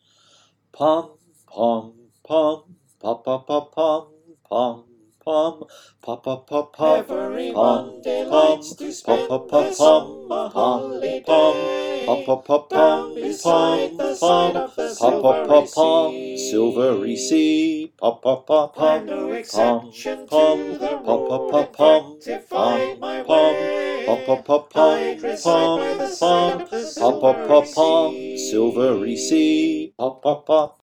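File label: verse parts bass, choir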